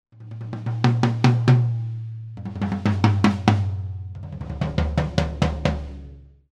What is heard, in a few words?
tom fill; Royer